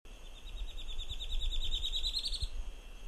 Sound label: Conopophaga lineata